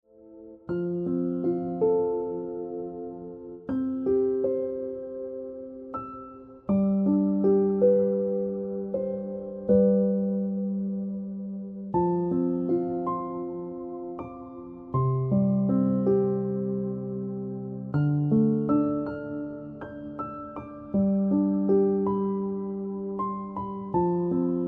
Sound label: Piano Music